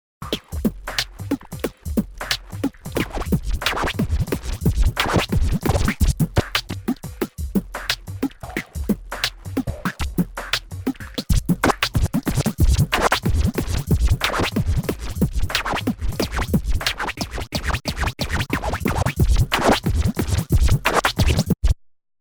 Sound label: BeatMangler